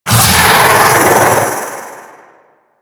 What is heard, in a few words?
Vocal Attack; Warframe; The New War; Archon; Nira